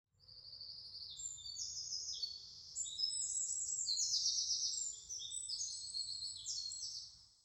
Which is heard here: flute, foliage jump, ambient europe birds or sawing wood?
ambient europe birds